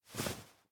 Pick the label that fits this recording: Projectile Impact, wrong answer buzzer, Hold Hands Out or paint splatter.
Hold Hands Out